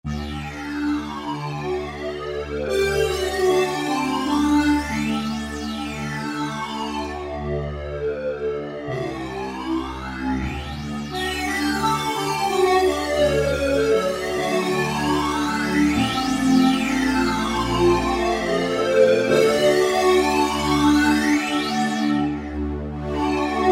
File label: Flange; Factory Preset examples